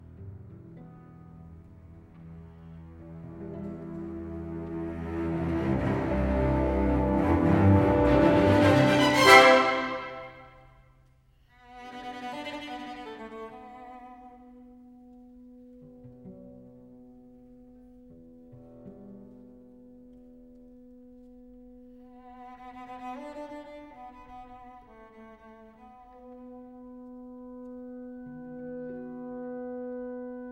Concerto for Cello and Orchestra in E minor, Allegro